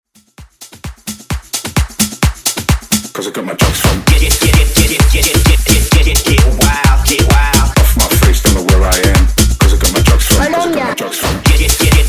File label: Funk Breakz 130Bpm